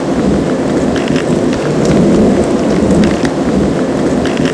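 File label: roll concrete smooth, terrains; Tony Hawk's Project 8; XBOX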